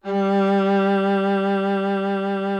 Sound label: VIOLA G2; Greytsounds VOL-1; VIOLIN+VIOLA